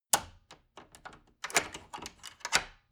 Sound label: wood door old lock turn key long